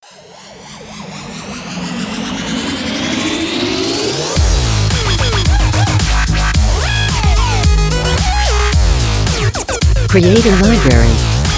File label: Dubstep Kill Jingle; Electronica